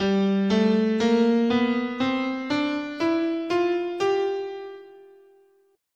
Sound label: G Bebop Minor, scale